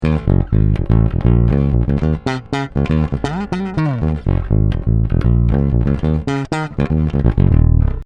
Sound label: neck PU passiv, bass